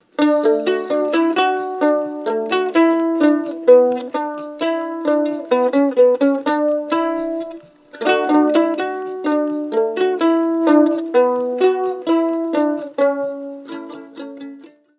Ukulele Test Uke Only

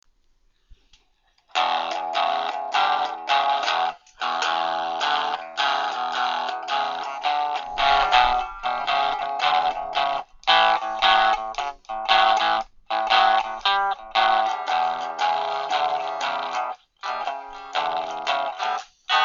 tin can amp; Build Your Own "Tinny Amp" small amplifi

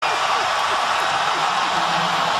cheer; Miniature Basketball Court Kobe Themed

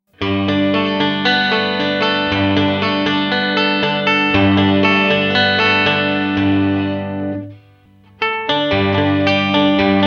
Amped 12 String Riffing

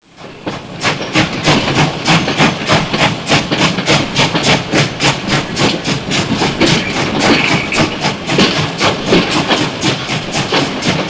steam train, Model Railway Station Sounds

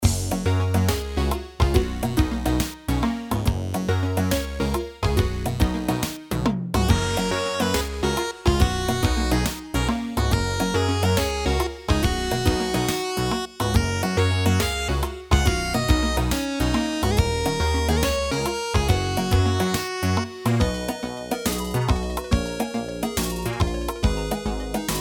Game Loop; Ninja Vs Zombies

